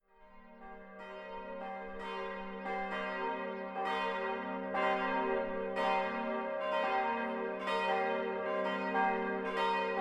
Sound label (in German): Volles Glockengelaeut